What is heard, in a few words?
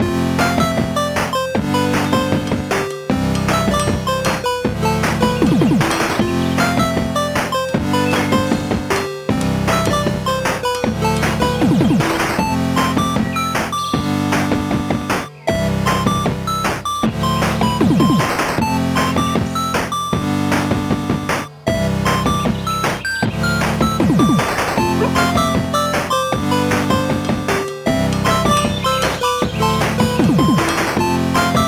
town music